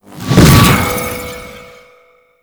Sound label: Smite Projectile Deactivate; Warframe; Paladin